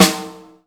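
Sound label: SNARE, Hip Hop; InVision Interactive; 40 Oz Phat Slammin Hip Hop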